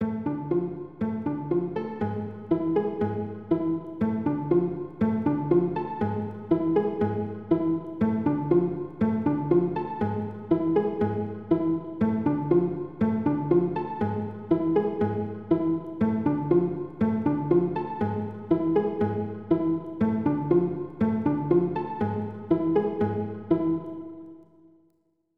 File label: Subtle Plucked